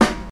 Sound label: clear acoustic snare a key